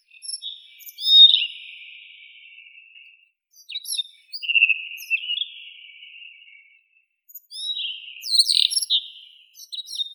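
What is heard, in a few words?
redstart, Phoenicurus erythronotus; gorihvostka krasnospinnaya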